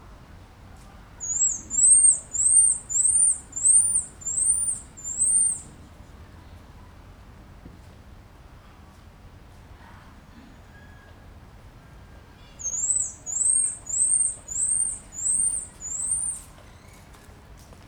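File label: Saguinus midas distant; The loud calls of a baby, separated from its group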